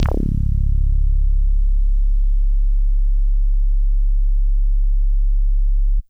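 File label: Synthe Bass DX100 C#4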